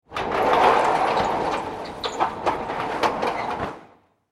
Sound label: Industry Warehouse Door Close, garage door